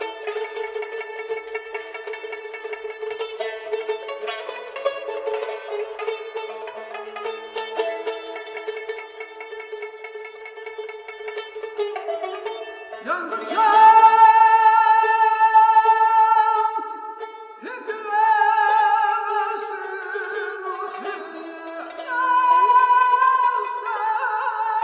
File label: mahur hindi; Məcnun